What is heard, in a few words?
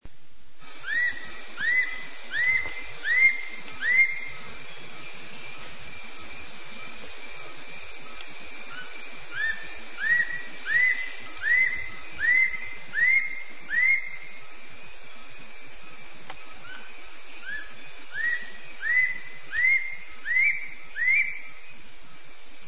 dull capped attila; Peru